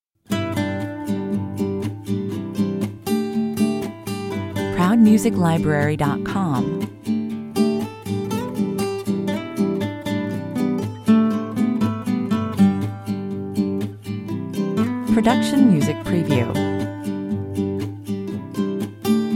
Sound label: blue eyes (guitar), 121 bpm